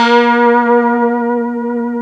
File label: PPG WAVE, OLD SYNTHS